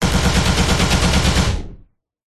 science fiction blaster gun automatic shoot fire rounds; zapsplat